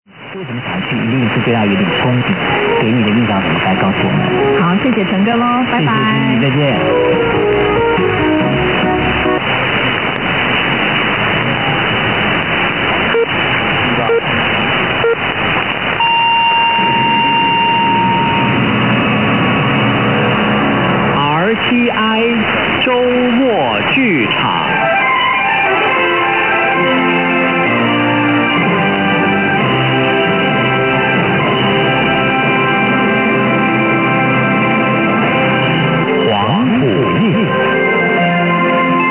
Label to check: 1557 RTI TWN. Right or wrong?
Right